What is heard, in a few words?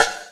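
snare crunch, drum machines; 300 Drum Machines; E-MU Orbit-3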